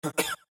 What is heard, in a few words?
Cough